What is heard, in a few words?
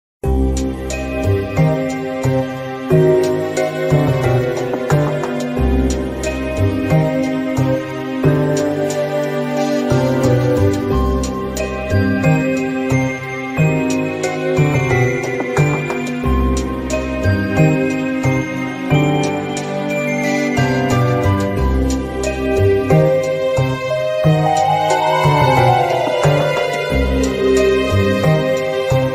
Ghost House; Super Mario Maker